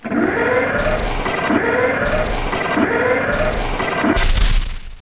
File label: door move